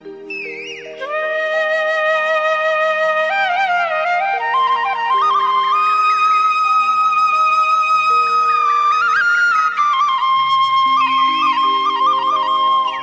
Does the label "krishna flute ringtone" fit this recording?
Yes